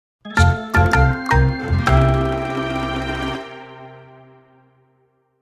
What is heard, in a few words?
Jungle Joint (Intro); Cooking Dash